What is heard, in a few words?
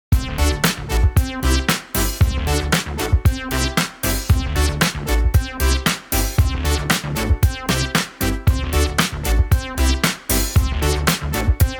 freespin melody; Amatic; Fortune Girl